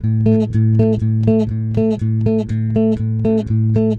110 Pop Basic Bass A, E-Bass; Pop Rock

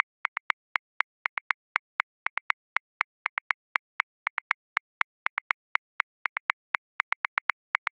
Straight Eighths Rock, Drum Booth, Grooves; Ableton

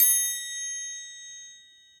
Triangle A Open, Latin Percussion; Drums; Drum Hits; Bell; Ableton; Factory Packs

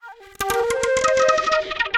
Heady Flute; Winds; Granulator III; Ableton; Factory Packs

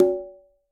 Quinto C Open, Conga; Latin Percussion; Drums; Drum Hits; Ableton; Factory Packs

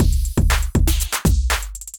Electrified Kit; Electrified Kits; Ableton; Bomblastic

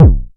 analog attacked, Kickbank

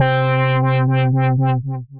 Synth Lead; Malice; Wavetable

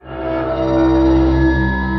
Bowed Guitar Scrapes, distressed strings; Instruments; Ableton; Mood Reel

